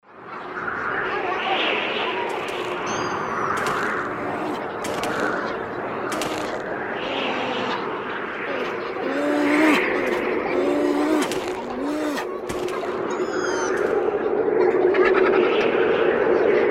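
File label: sounds of bear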